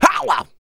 YELL, MALE HUMAN; ILIO; Vocal Planet

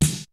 kicks snare, kid; Kid Nepro; Elite; KICKS SNARES

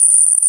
noise fx; Masterbits; Switch FX

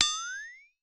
FLEX-A-TO, FLEX-A-TONE; Voice Crystal - Steve Reid Definitive Percussion

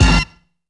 PHEW STAB; Zero-G; Funky Elements

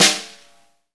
snare, Roland; Club-50 - Foundations Roland; Ext.Snare 4

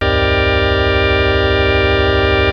vox, dx7

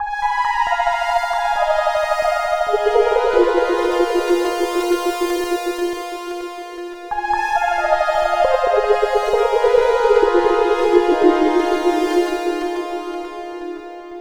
Lead

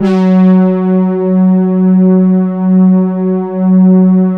OBERH, PADS; Vintage Voltage

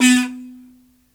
Buzz Xylophn; Roland LCDP11 Africa VOL-1; Bala & Xylo

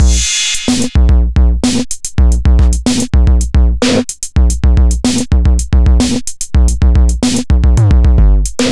beat boy 110bpm

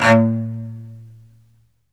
SOLO CE, Strings; ILIO

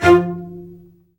STR, Vcs Odd Marc; STR Vcs Marc-Piz

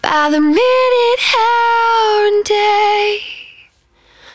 110bpm Ab, Velvet Vocal; Zero-G

